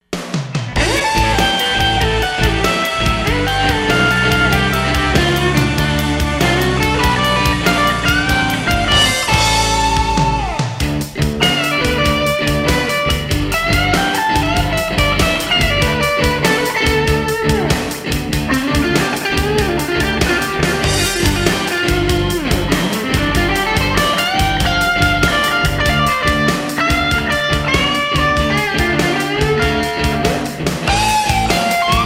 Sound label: Blues, guitar; Miami